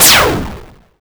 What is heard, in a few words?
Hard Pew